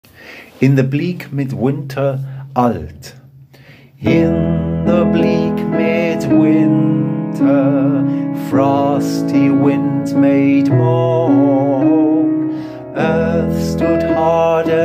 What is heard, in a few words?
In the bleak midwinter Alt